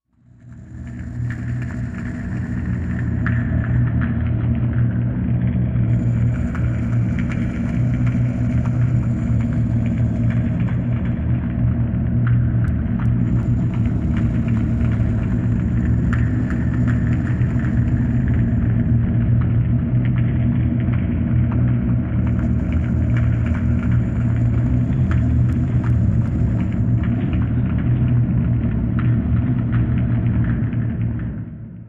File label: Engine Room Drone Ambient Engine Space Ship Motion; power core hum